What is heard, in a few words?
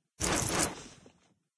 deagle deploy; Source Engine; cstrike